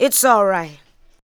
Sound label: ADLIBS